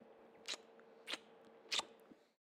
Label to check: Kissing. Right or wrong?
Right